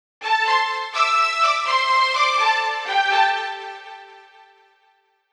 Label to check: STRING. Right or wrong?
Right